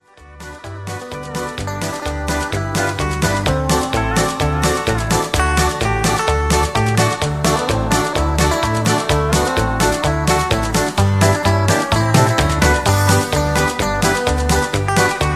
music with strong melody

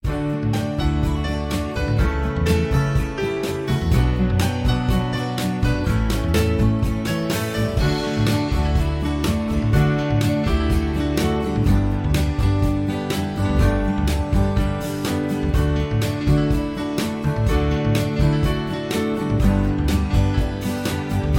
American Flag On The Moon Instrumental

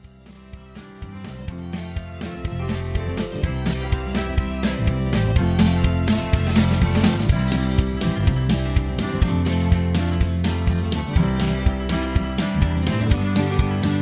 Instrumental Higher Key

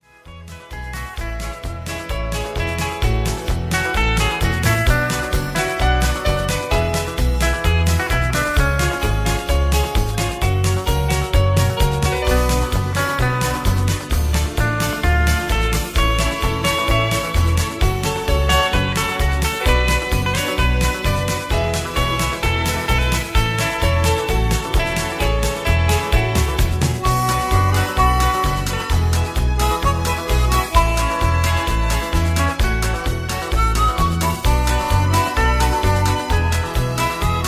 Instrumental with More Melody; Singing Calls; Big Band

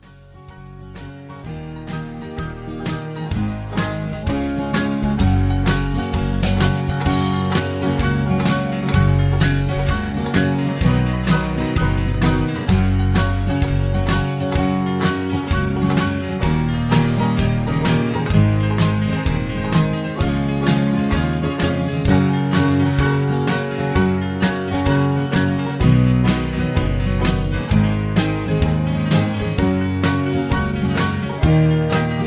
Instrumental Lower Key

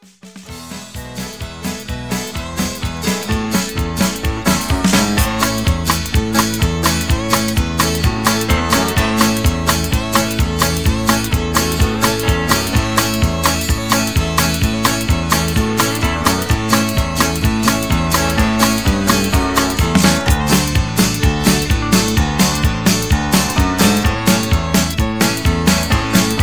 Patter

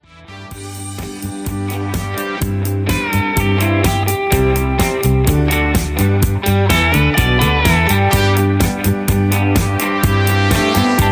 Instrumental No Leads

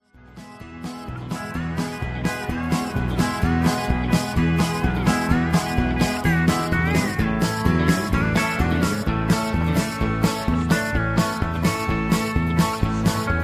Instrumental with Harmony; Singing Calls

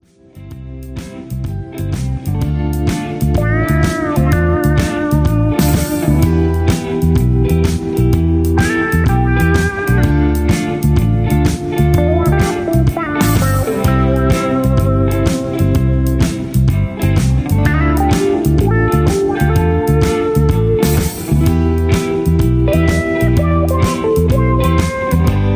Instrumental with No Leads

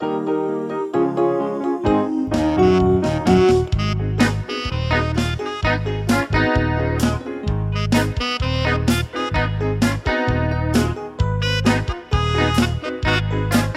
Instrumental

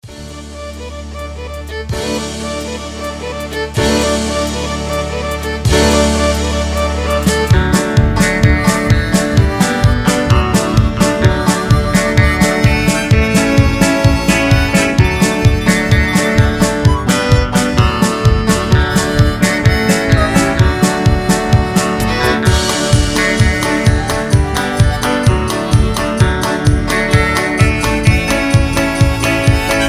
Amazing Grace Instrumental

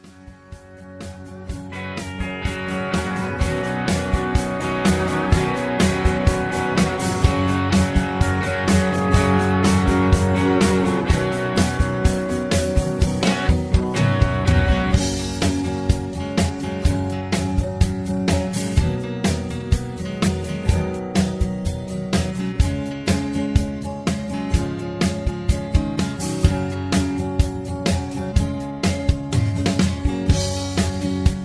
Instrumental With Leads Lower

